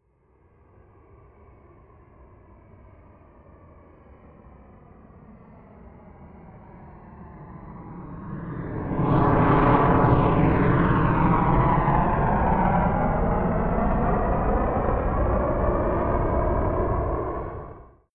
military jet b-1b lancer flyby left to right fast distant; Airplanes - Jets